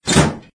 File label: Blackhole Door Close